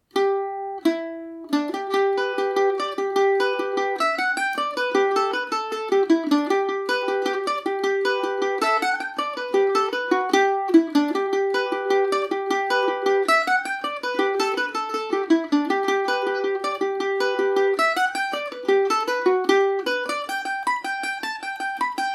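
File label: G major paris swing, irish mandolin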